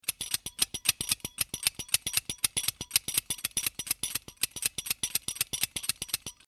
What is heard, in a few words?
HiHat